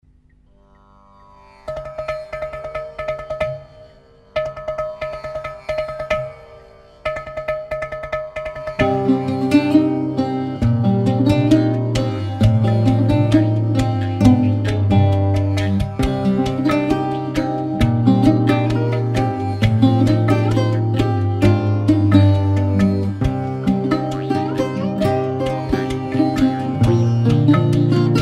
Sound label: moving strings